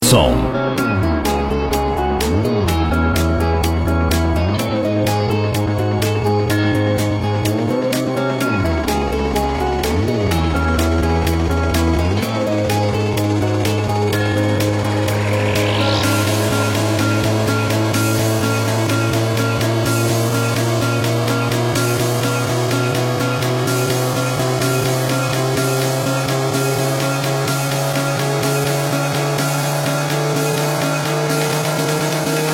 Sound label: Electro